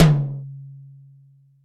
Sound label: high tom sample c sharp key